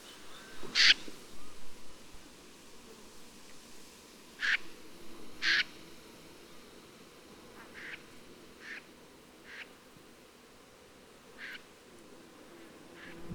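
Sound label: common snipe gallinago flight call; karrend-wiesen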